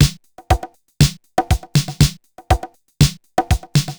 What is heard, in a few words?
TR 505 (120BPM), beats; musicradar; cyborg beats